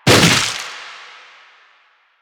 Proto Infested Runner Arm Attack Hit; Warframe

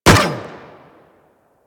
Energy Rifle Fire; Warframe; Venus; Venus Spaceman Carrier Agent

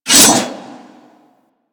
Great Sword Swipe

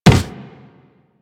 Walk Wood, Footsteps; Warframe; Operator

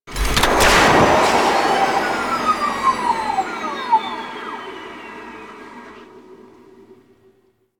Skiff Engine Boost Medium Stop, Vehicles; Warframe; Grineer Skiff Player Vehicle

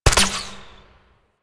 Tranquillizer Rifle Shot; Warframe; Tenno

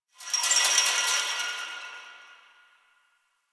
Fence Rattle One Off; Ambience; Warframe; NineteenNinetyNine

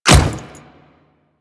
Royal Guard Default Step, Footsteps; Warframe; Grineer Royal Guard

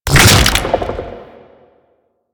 Octopede Head Spin; Warframe; Man In The Wall

